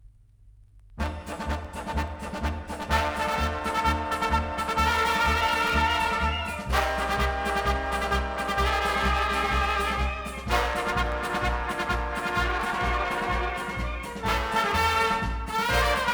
orchester, pasodoble

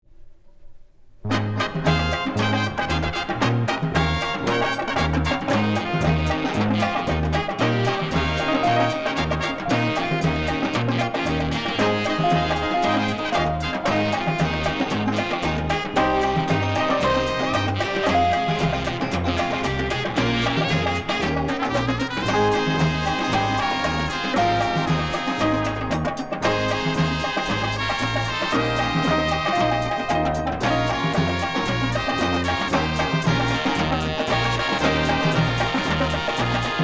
samba

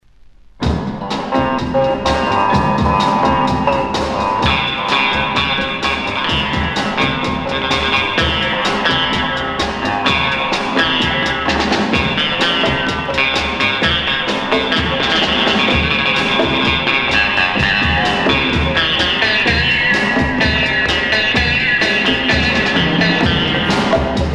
strings, sitar

